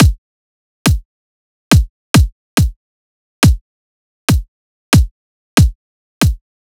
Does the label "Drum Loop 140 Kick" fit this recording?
Yes